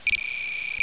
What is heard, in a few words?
process